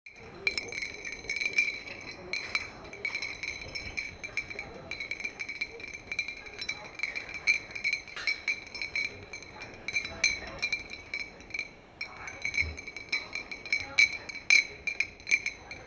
ambiense of a bar in

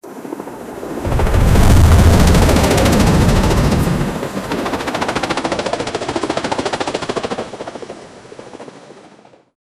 cruise ship battles storm; captain

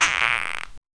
the sound of a rubber

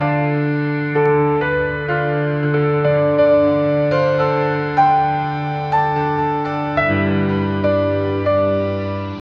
piano d minor

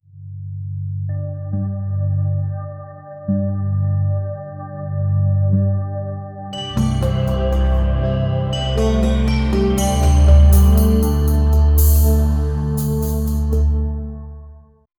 music that first, calm

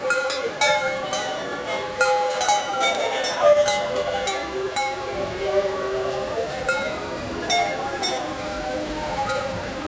marketplace

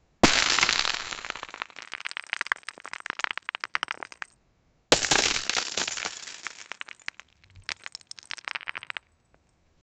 deep natural sounding crystals

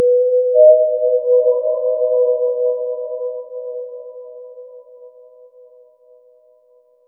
eerie soft notification; objective

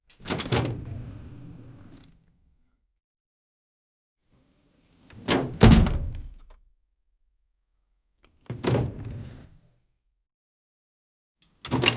loud closing of closet do

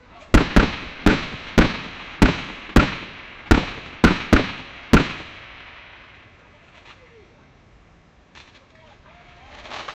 firework placement soft

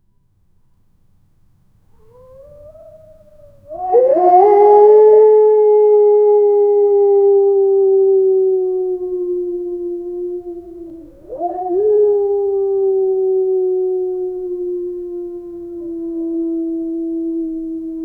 4 wolves howling, canyon

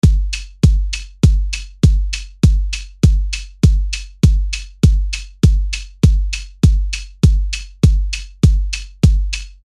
stick beat effect, rhythm

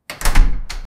fail to open a locked, doors

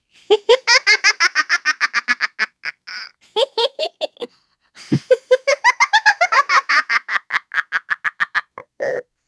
cartoon mischievous laugh; human vocal

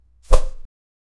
punch swing

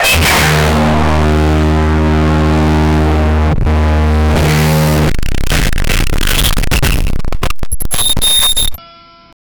bass boosted screaming

